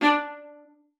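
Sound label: D5, viola spiccato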